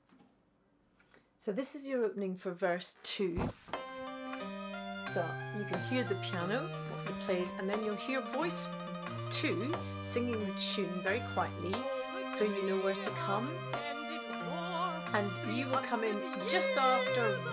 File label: Song VERSE Tenor click; VERSE 2